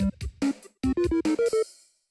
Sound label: Test Bgm